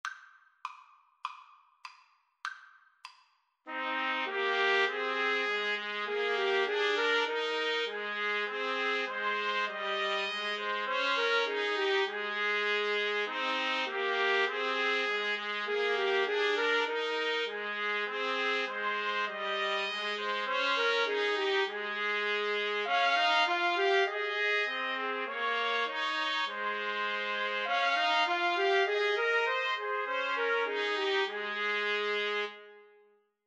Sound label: TPT trio, Trumpet Trio